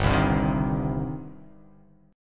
G Suspended 4th Sharp 5th G down; strummed

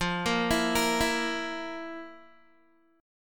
F Major 7th Suspended 4th F, Guitar; arpeggiated

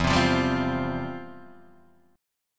C Suspended 4th E; Csus4/E strummed; JGuitar

